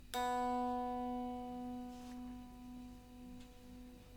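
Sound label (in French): Electrique si, guitare électrique stratocaster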